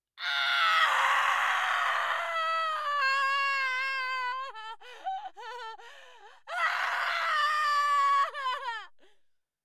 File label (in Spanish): GRITO